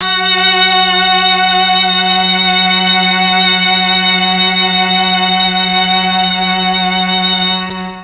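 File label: F#3 MIXED STRINGS, Mellotron; Mike Pinder - Mellotron Archive CD; MIXED STRGS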